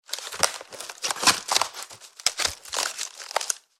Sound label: Firewood kindling movement; wooden